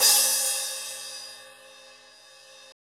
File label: HH, Kits, Rev